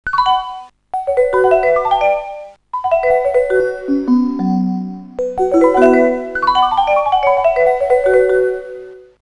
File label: chimes wave